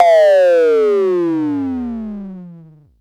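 KLONE FREQUENCY MODULATION, Dual Percussion Synthesiser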